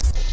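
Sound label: sharp sound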